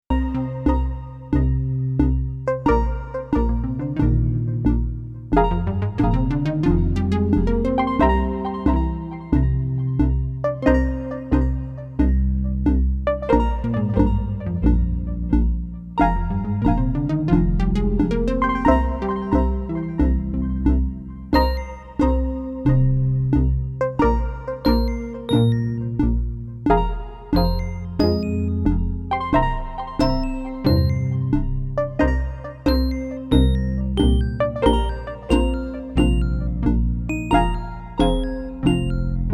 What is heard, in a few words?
OP-1 Sample